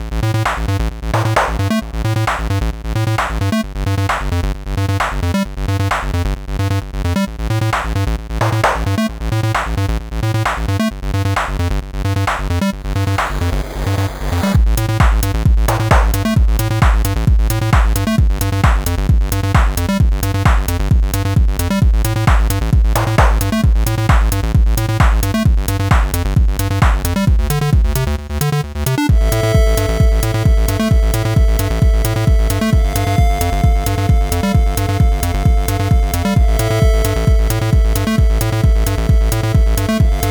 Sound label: background track; steamcommunity; minigame; towerattack